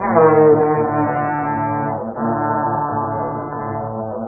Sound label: Ghee Horn Drone, Woodwind